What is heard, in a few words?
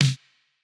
snare; Reference Beat BPM120; j city pop 1980